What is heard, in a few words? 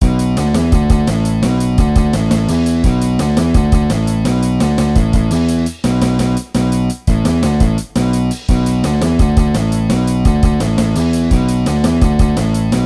intro, bgm; DungeonShooting